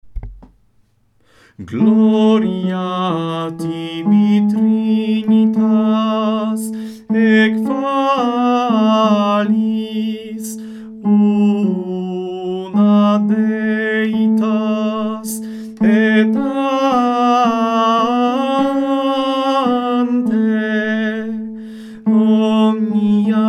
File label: Gloria Tibi Trinitas Psalm tenor; pasyjne; meskie